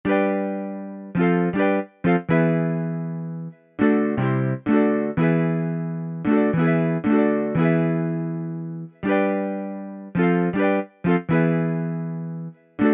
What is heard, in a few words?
Strum